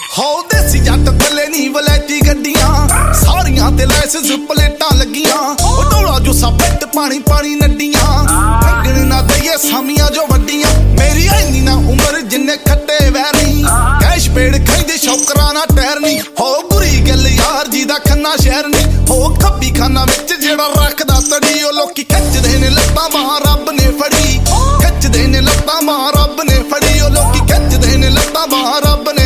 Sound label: Punjabi Song Ringtone